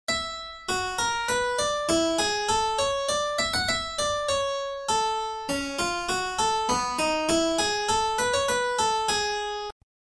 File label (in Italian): Tema e risposta